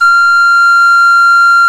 SOP F 4, MOECK; SoundBytes - Early Patches

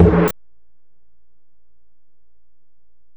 Ride, Alesis DM-PRO, Drum Machines

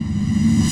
sfx sci fi; Masterbits - Switch FX

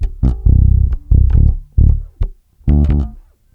BASS LOOP, Ragga & Dub Reggae

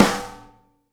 SNARE, Live Snares

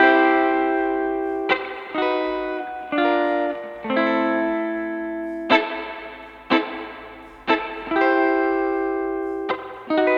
Guitar, 70s Analog Funk; Sonic Foundry (Sony Creative Software); What it Is!; Classic Caddy

